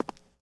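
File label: Foot Concrete Shoes Walk; Lucius III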